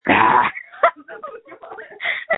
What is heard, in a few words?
screams